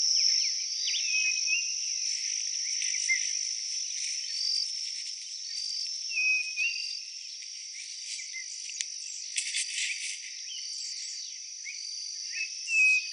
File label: nothura boraquira